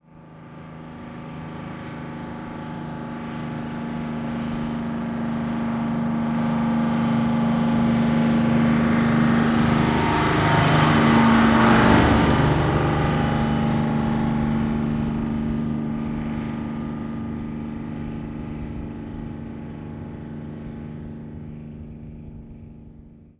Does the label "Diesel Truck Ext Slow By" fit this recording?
Yes